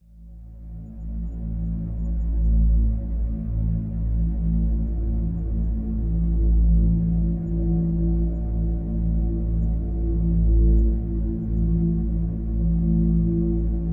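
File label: Drone Geosyncronous (A); Brand X Music; Brand X Music Catalogue - Editor's Essentials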